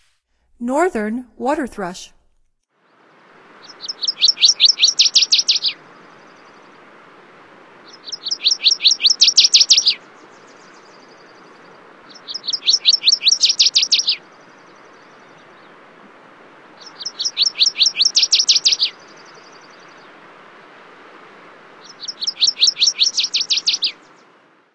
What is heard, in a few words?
Northern Waterthrush, Songs of Birds of North America; Stokes